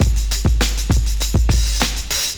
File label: BEAT, Beats 101 bpm, Hip Hop; E-MU Formula 4000 Series Vol. 1; Hip Hop Nation